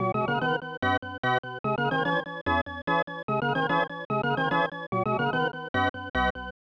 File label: Fanfare Happiness; super bases loaded 3; snes